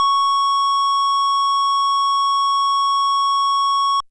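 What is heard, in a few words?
FM Sq Dist DX100 C#1; square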